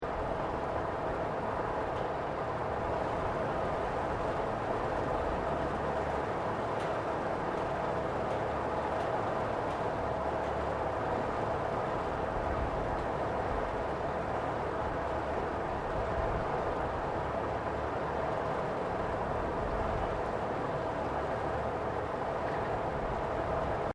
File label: PLANT ROOM HOSPITAL DISTANT LOOP, Airconditioning plant machinery; Air Conditioner Sound Effects